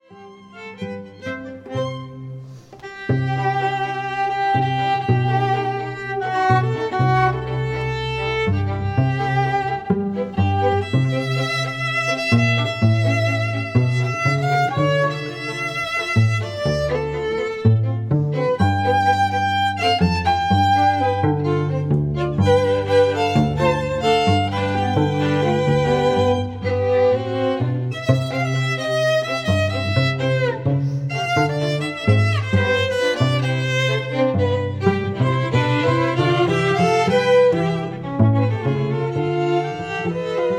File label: strings, All-Latin String Ensemble; classical musicians